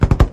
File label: pop, fireworks